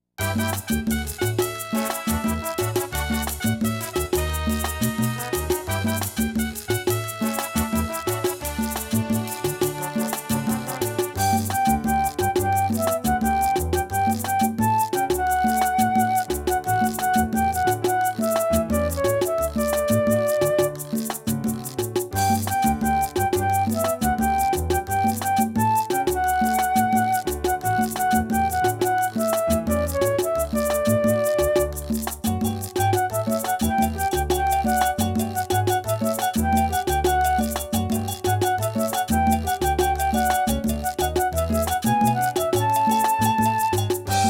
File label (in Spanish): (No Vocals)